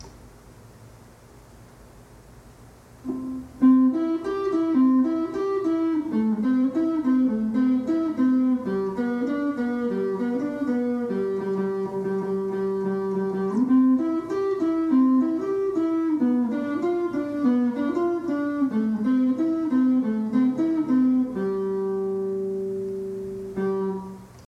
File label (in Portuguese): na guitarra